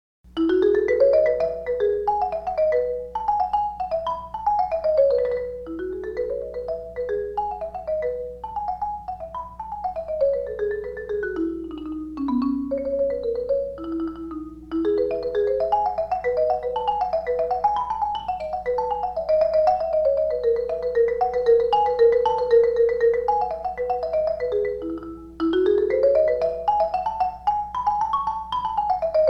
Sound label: mallets